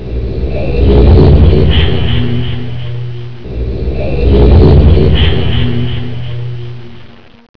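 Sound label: Transport, motoren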